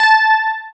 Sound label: GUI, E-Guitar